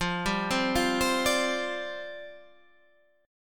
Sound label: F 6th Suspended 2nd F, chord